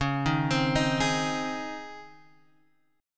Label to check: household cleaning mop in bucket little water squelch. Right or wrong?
Wrong